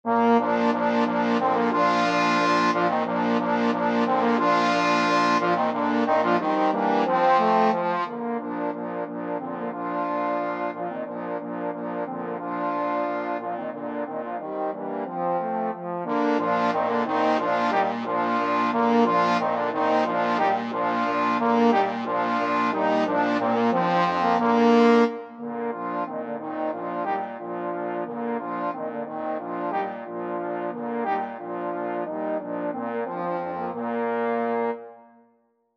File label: trombone quartet